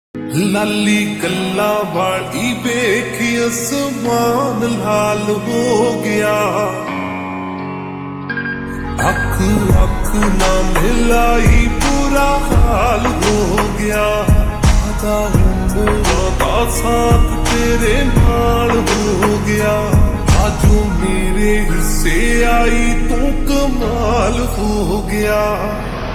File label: Ringtone Slowed Reverb